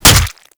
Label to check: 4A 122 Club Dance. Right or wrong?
Wrong